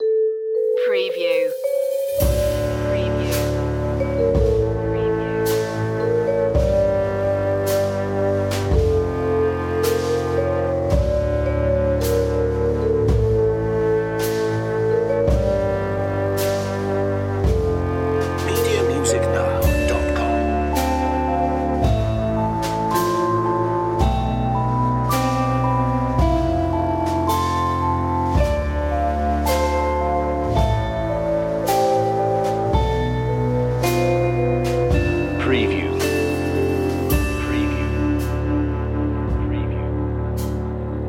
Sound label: the big sleep no piano